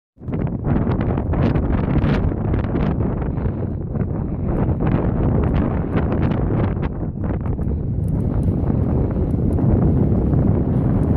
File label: mmm